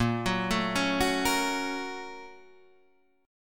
A sharp Minor Major 7th Sharp 5th A sharp, Guitar, Half Step Down